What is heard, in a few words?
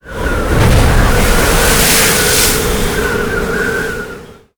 ambient battle; World Of Warships